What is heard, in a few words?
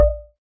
adjust volume; Smartisan OS 6.0.0